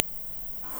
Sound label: ring, doorbell